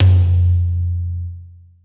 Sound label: low tom